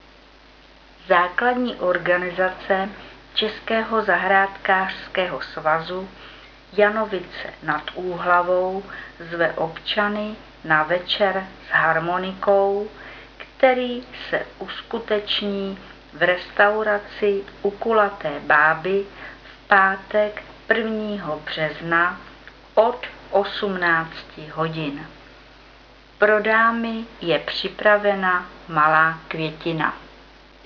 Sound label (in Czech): harmonika; Večer s harmonikou; restauraci U Kulaté báby